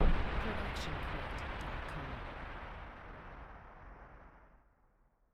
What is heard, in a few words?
Explosion Blast; SoundsCrate